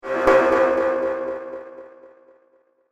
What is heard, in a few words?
Whoosh, Resonating Hit; Whoosh Sound Effects; Andrew Fleming